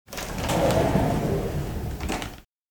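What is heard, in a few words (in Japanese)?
hikido open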